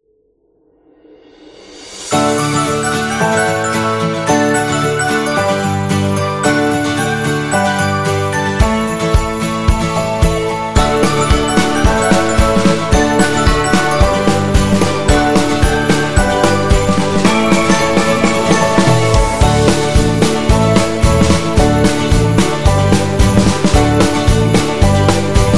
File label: Happy Winter Holiday, happy music